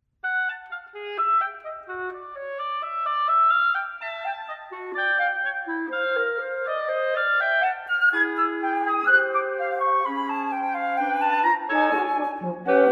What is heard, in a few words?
Wind Quintet, Allegro Non Troppo